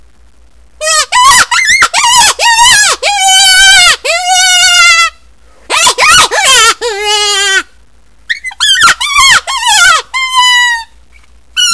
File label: Yote Smoker Distress, rabbits